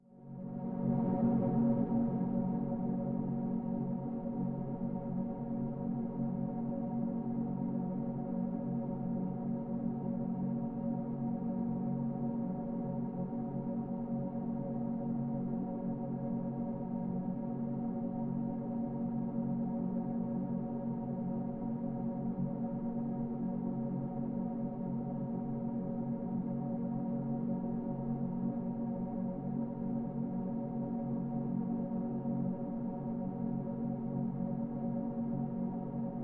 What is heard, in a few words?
Pad F Sharp Major; M8